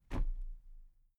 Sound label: toolbox place upright soft